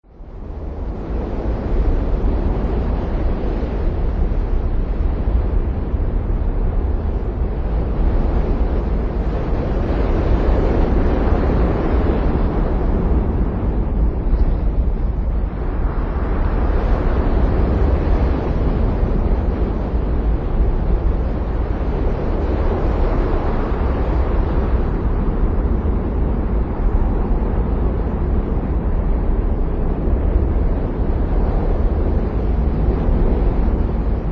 Ambient Wind; RollerCoaster Tycoon 3

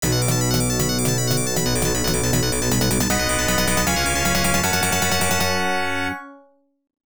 Unused Jingle; Sadari; arcade

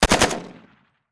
Machine Gun Shot; SFX TacticsMachineGunShot

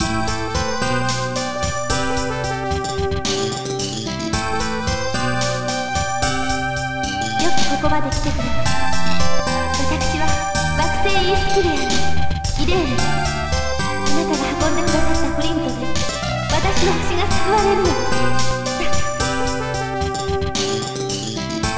With Voice; Xexex; arcade

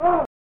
"Ughh!"; Winter Olympic Games 1994; SNES

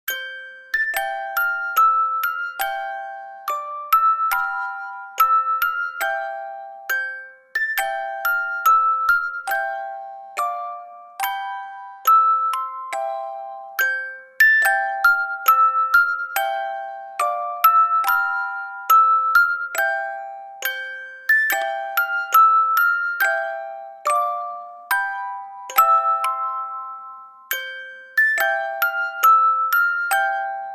London Bridge is Falling Down, Lullabies; The Sims 4

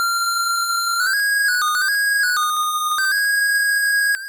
Flute; Wonder Boy in Monster Land Master System

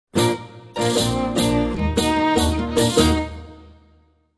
Russia Music; Civilization Revolution; Xbox

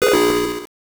Game Paused; simpsons the bart the beanstalk game boy

